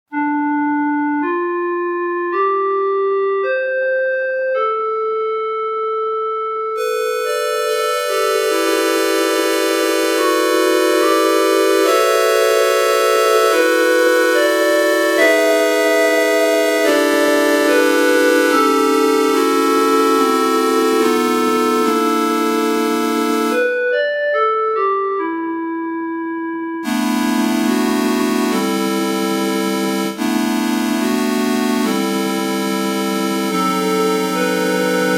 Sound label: clarinet sample; clarinet (in Bb)